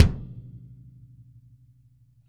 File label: KICK, Drum Kits